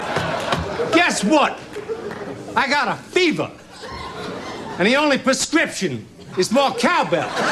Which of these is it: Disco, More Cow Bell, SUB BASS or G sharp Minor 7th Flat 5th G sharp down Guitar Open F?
More Cow Bell